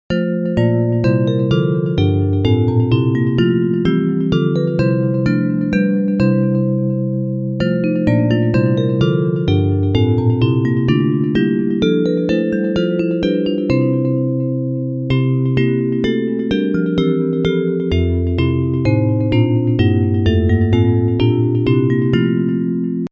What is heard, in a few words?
Bells